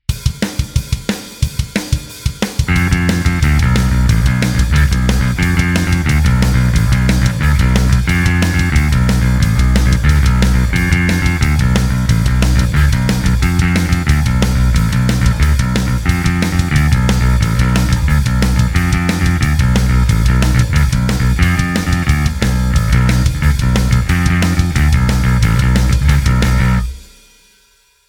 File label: Riffs